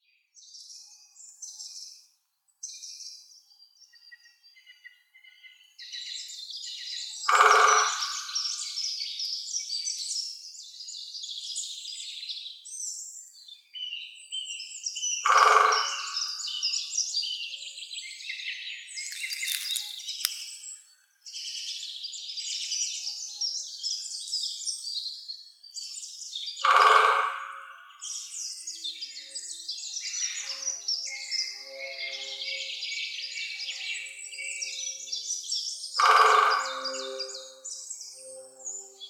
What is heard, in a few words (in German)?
Spechte Picidae; Vogelstimmen